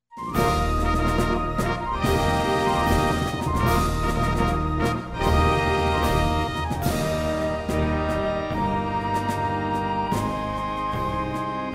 Eb, orchestrated acc; Hail to Music

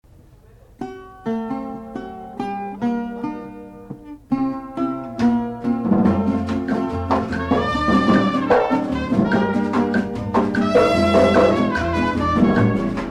Afro-Cuban Son

